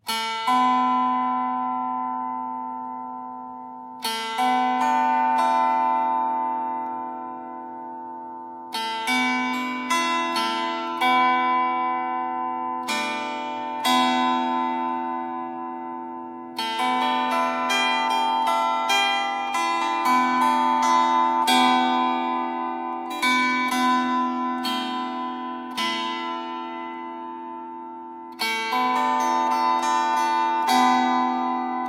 improvisation for Welsh plucked cruit; Cerddoriaeth

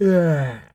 "UAHH", SHOUT; 1000fx

